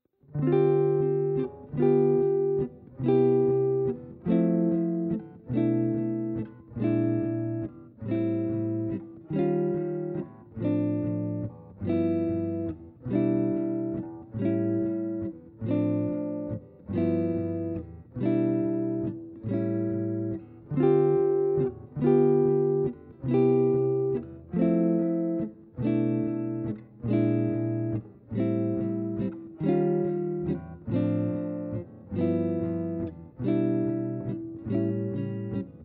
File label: Jazz Chord Comp; jazz tunes